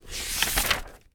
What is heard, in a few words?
turn page, reading book; 1000fx